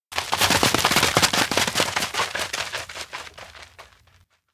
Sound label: steps outdoor ground run start multi; anemoi